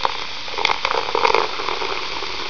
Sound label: cola